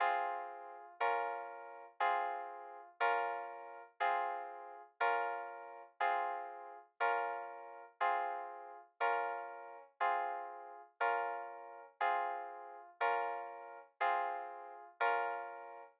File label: Verse piano part